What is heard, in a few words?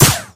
crit hit mini; video games; source engine; tf